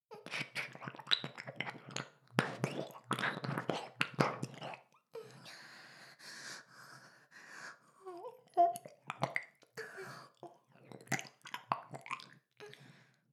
耳舐め音声(LR逆ver); ダメなメイド

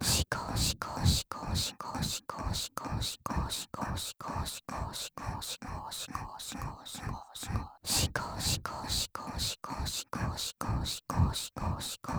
シコシコ＋アッアッ 普通

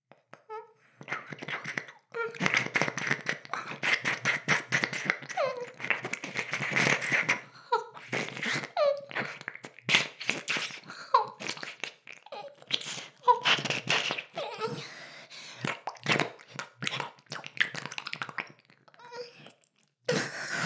耳舐め音声(LR逆ver); ASMR; ダメなメイド

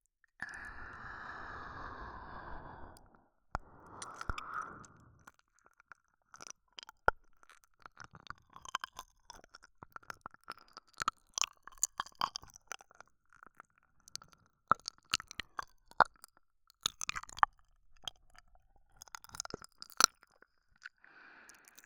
耳舐め, ASMR

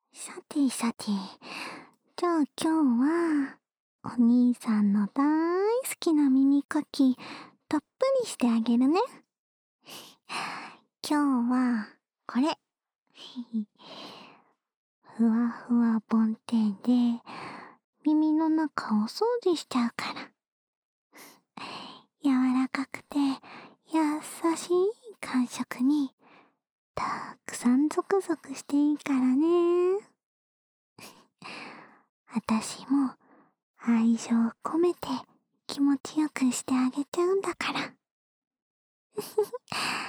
安眠用梵天耳かき（右耳）, ASMR

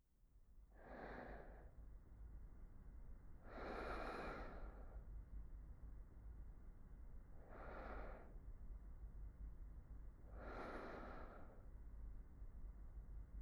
特典トラック 寝息(左側)

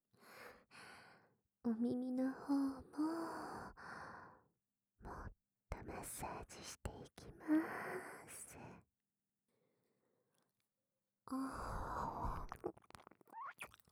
耳舐めマッサージ, ASMR

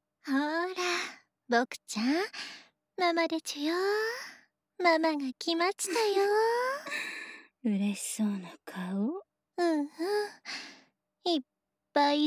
ローションぬるぬる密着Ｗ生セックス ノイズあり; ASMR

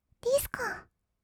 ディスコ; 1200 kawaii voice!!

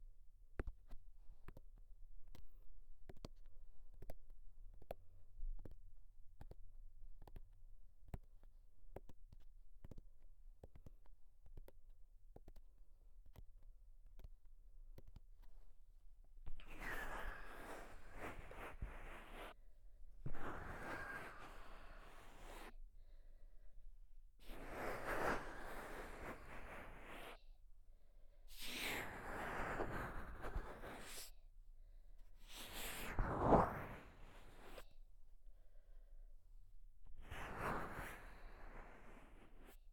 タッピング・耳撫で, 左耳

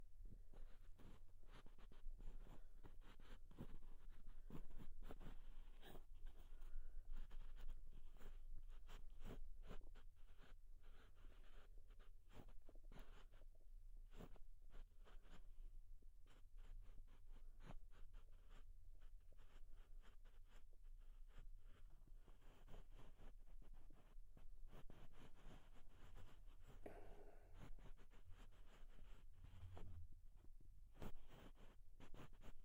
木を使った耳かき, 左耳

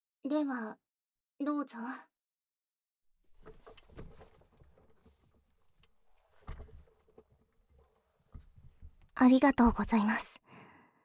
ヘッドスパ, ASMR

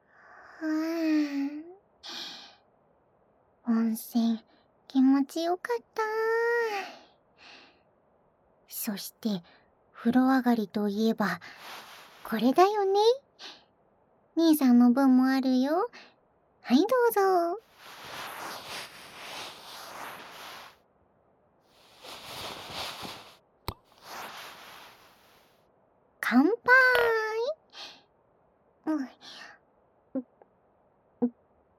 耳かき; にーさん、温泉上がりに耳かきしてあげる

